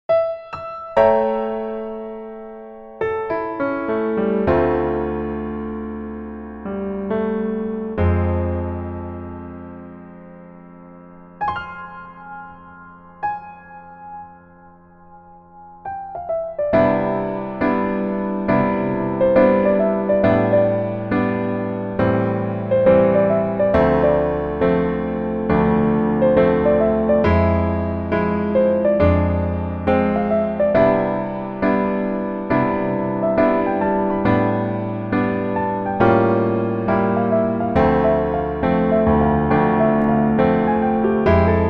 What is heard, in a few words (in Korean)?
Piano ver, 멜로디MR